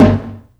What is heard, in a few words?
garage, toms; Real